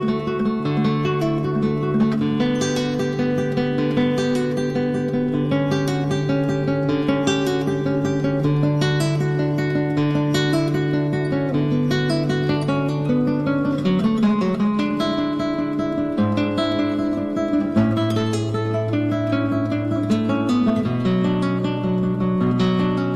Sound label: Cello Suite No. 1- Prelude